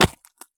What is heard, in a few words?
landing gravel; Escape from Tarkov